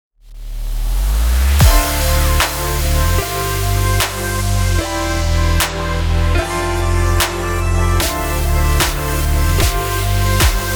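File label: Future Bass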